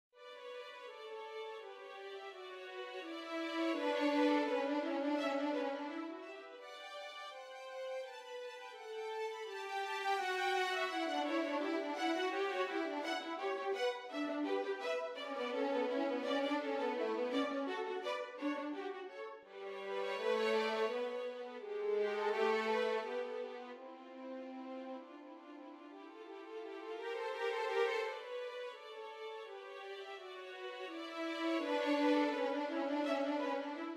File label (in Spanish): 2 violines; duo violin; nivel dificultad medio